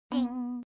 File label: ding; konata